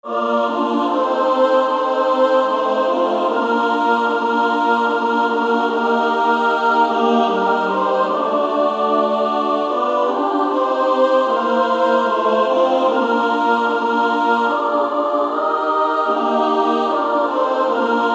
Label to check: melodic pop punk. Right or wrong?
Wrong